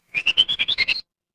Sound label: animal bird